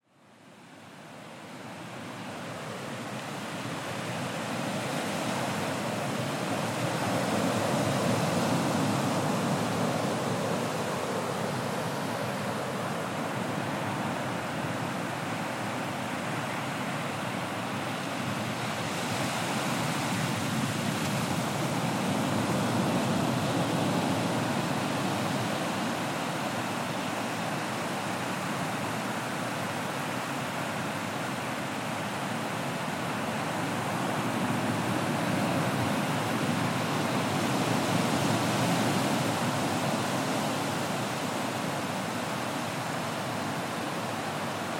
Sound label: ocean sounds Costa Rica